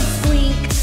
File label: squeek